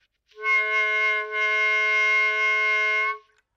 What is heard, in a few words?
noise